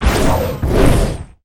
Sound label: Anger butcher attack